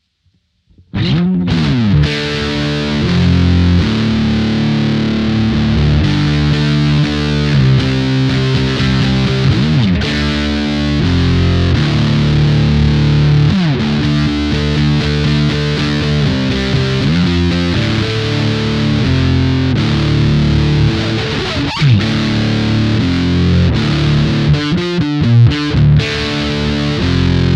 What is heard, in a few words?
GA-3 fullgain